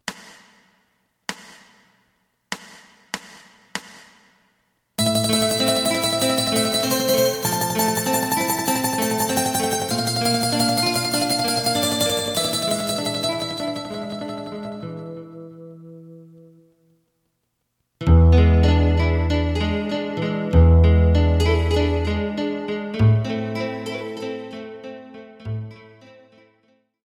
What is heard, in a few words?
lead